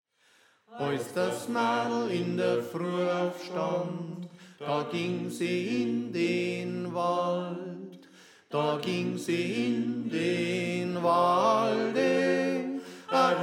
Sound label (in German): Tenor